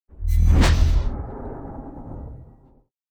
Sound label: engine warp; Star Trek Adversaries; macos